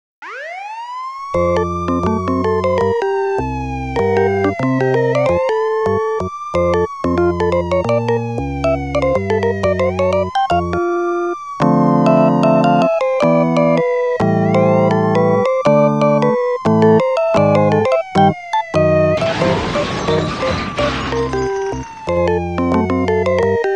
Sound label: Siren Crash